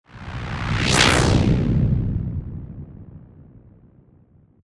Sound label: Whoosh